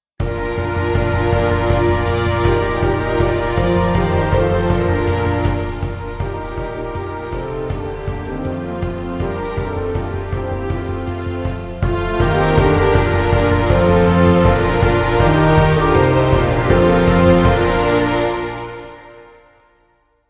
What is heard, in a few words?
Blow Thy Horn, Hunter; Flexible Ensemble Disk No. 1